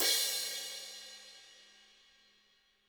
HiHat & Crash, Roland TD-20; HiHats

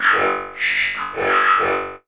FX VOX; AMG - Vince Clarke Lucky Bastard

